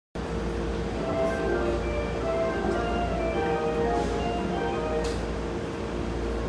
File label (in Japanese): JR武蔵野線吉川美南東